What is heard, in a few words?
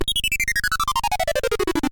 Commando Alert; ringtones